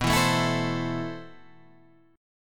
A Minor B, guitar; Am/B

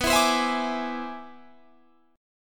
C Augmented Major 9th B

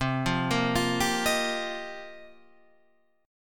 F 9th Suspended 4th C, chord

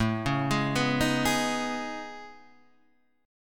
A Major Sharp 11th, Guitar, Half Step Down; AM#11

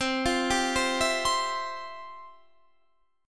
F Major 7th Suspended 2nd, C, guitar

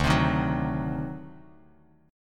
D 7th Flat 9th D down Bass Standard

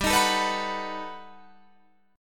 G Augmented Major 9th, Guitar, Open D

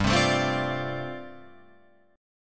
G 7th Suspended 2nd Suspended 4th, Guitar Open F

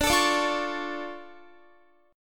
D Minor Major 7th D down Guitar; DmM7; strummed

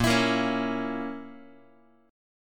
A sharp Diminished Guitar, chord; strummed; JGuitar

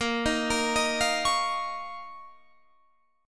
F 7th Suspended 4th Sharp 5th A sharp

